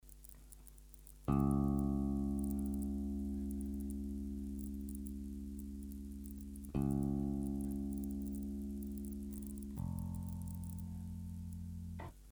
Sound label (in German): bass knistern, e-bass